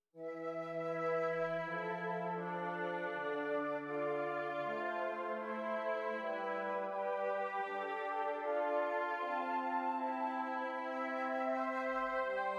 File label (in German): Stimmiger Contrapunkt; Ronge